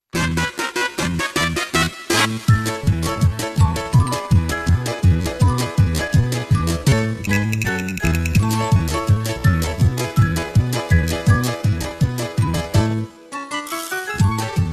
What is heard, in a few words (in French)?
karaoké